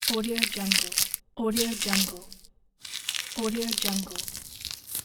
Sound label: mud squish